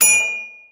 Collect item; The Powerpuff Girls - Mojo Mayhem; NextlevelGames

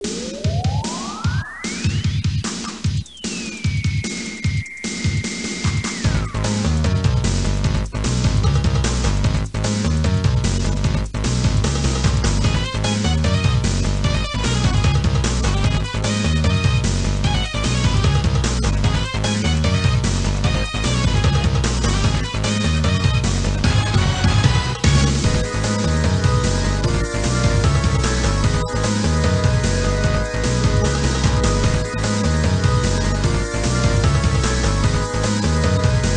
game bg; Zombie Smasher

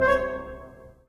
music swipe stab blocked; run-dorothy

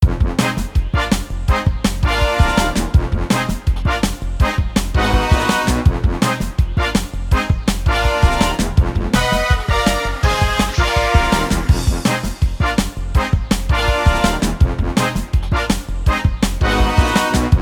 music; Bananaman - Chase in Space

